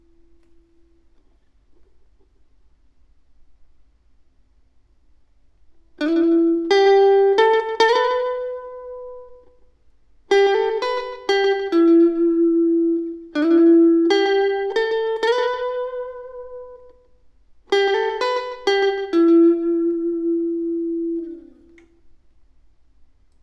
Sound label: Elect Guitar